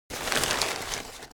object paper rustle

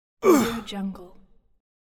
man soft pain

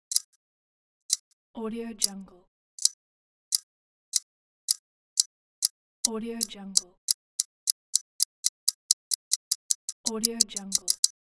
clock ticking slow to fast

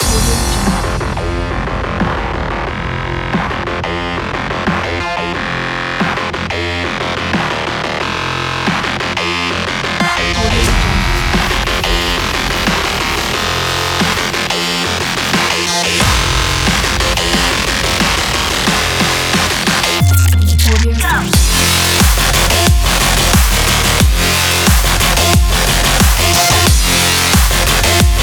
a dubstep

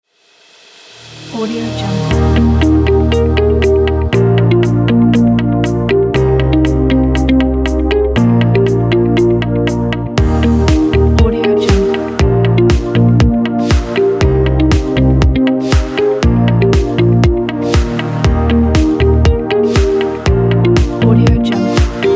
soft inspiring music; prof-s

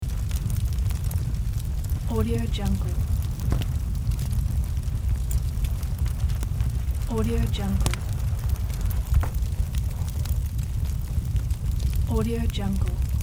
fire; tr-sound

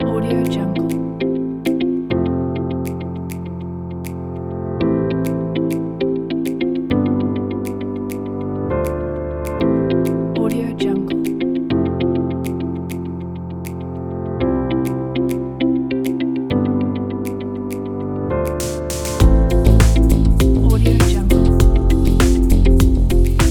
soft inspiration motivate pack

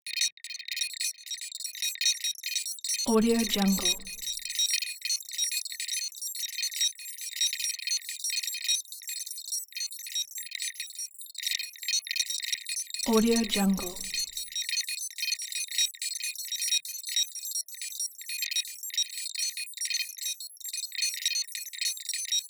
modular ui micro gestures; soundmorph

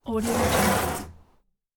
heavy wood drag; stormwaveaudio

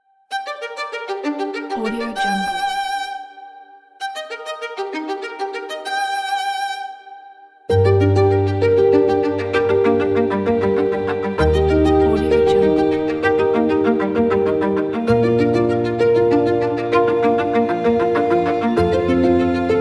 violin; artarea-studio

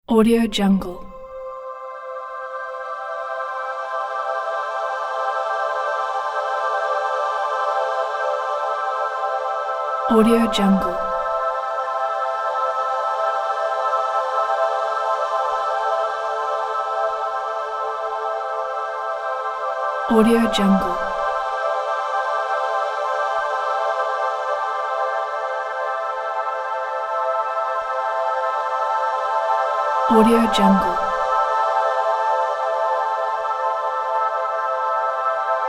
synth pad with voices ambience; sound ideas

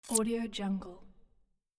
futuristic

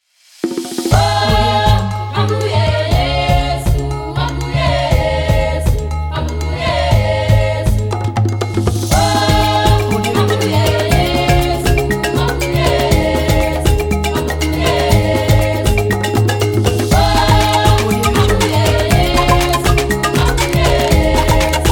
africa intro logo; puremusic

